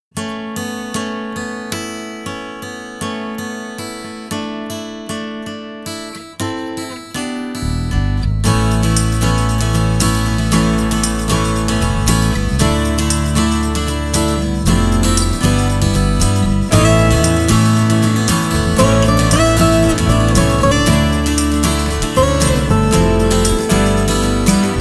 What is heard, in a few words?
With The Wind